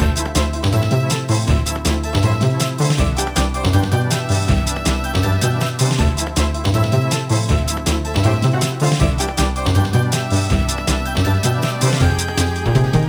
win; NiGHTS into Dreams; ps2

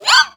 bug; Birthday Party Bash; Wii; Spanish; bug catcher

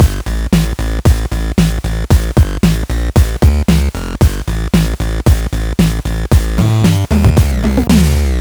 Points Counter Scene; Iron Meat; PS4 PS5 Switch Windows Xbox One Xbox Series XS gamerip 2024

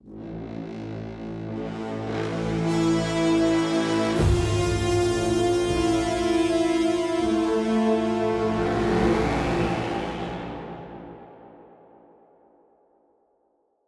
Sting Hyper Jump Hazardous

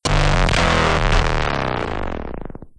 Failed; Smack That Gugl; android ios gamerip 2010

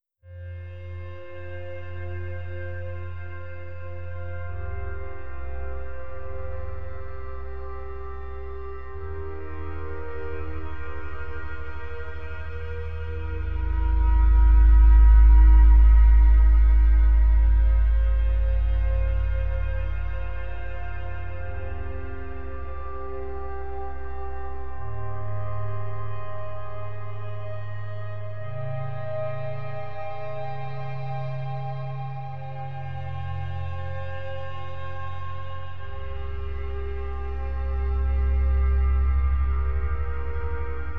Synth Lead; Summerland

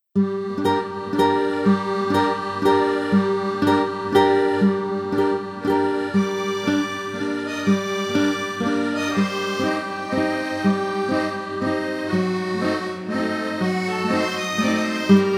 Small French Port; Pirates of the Burning Sea

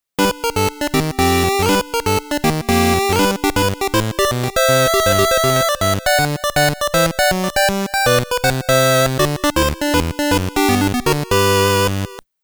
[GB Chip]; Lola Meltdown

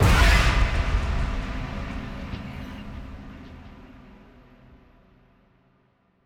shock instant; Joe's Diner

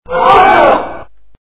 Crowd Shock; flash online windows gamerip 2008; Sonic Smash Brothers

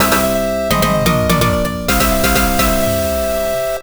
Winning Fanfare; Star Platinum; PC-98 gamerip 1996

